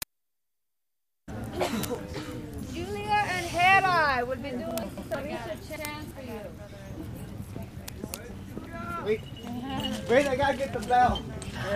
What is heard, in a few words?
Chant; 2000 Rainbow Montana